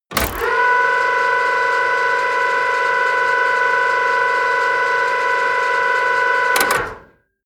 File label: fire truck ladder rotate